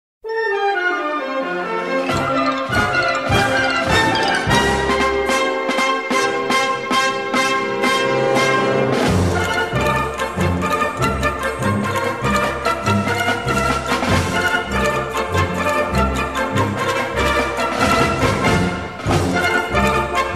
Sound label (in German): Walzer; Espana